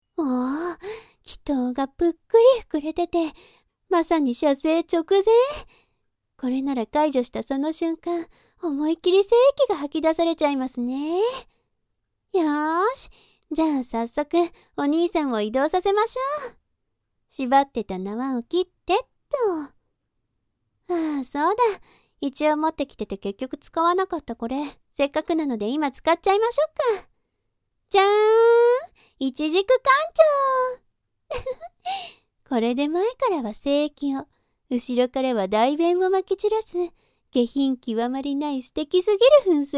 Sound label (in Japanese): 悲鳴 ボイス 挿入; バイバーイ